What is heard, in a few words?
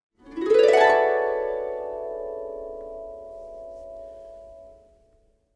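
sound of page turning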